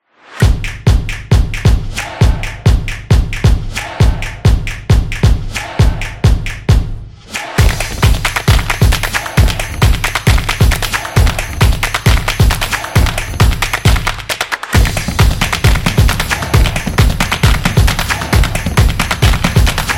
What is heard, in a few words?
Upbeat Drums Action; samba